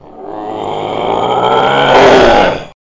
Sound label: roar; cutty